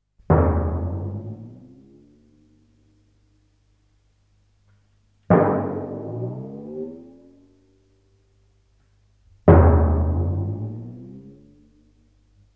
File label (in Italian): TIMPANI